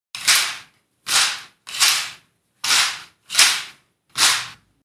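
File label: sound of curtain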